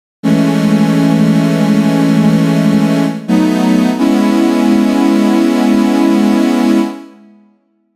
Synth Pad

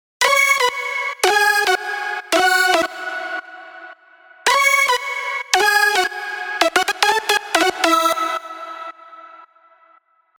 lead

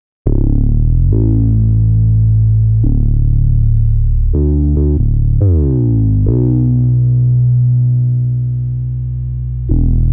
Synth Bass

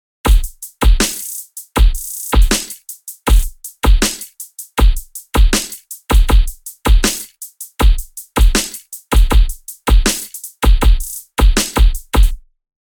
Drum

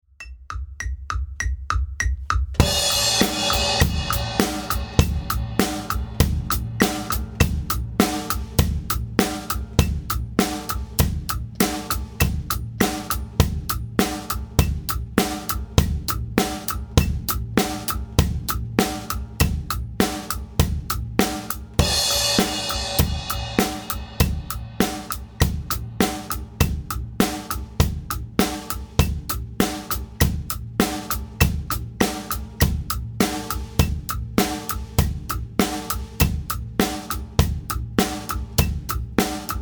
Drum

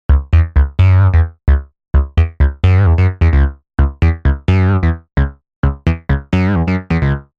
Bass

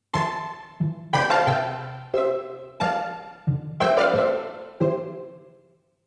strings pizz

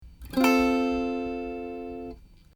dm7 high

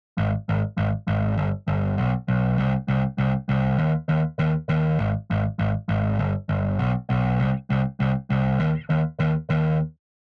Bass

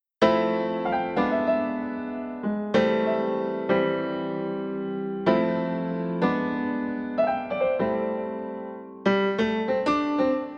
Piano

